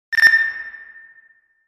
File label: filthy beep